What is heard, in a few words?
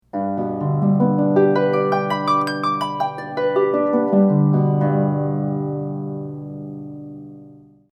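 Scale, harp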